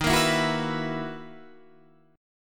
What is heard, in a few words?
B Major 11th Eb, guitar